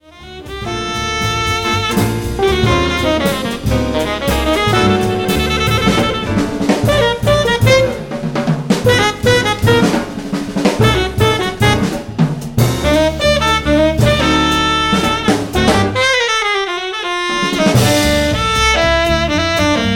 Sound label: Blues, Quintet